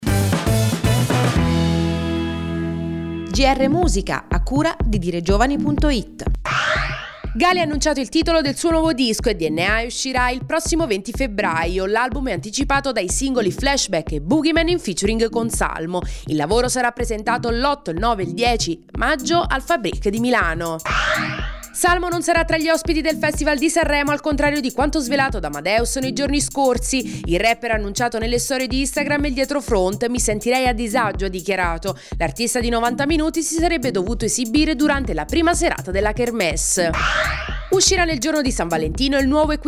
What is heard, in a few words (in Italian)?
musica